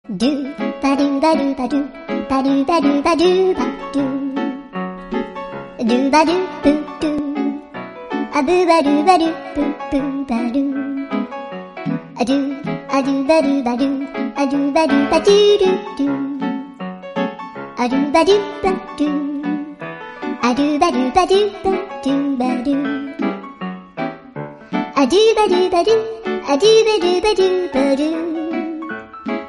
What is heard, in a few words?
flute music bgm instrumental